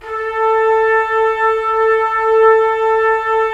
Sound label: Trumpets; Roland - LA Composer vol. 4